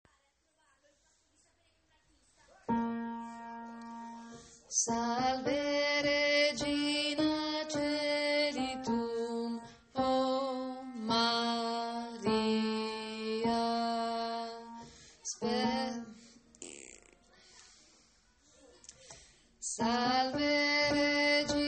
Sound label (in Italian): salve regina contralti